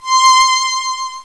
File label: Violin